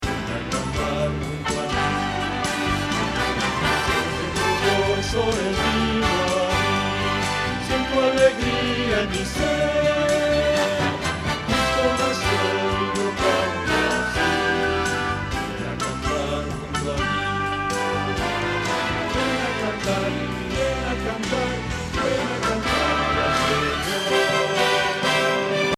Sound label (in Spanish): Bajo